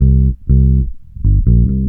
REGGAE C, Bass Grooves; USB Soundscan vol.30; AKAI

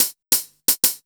DRMSND, Pure Electro, BEATKIT

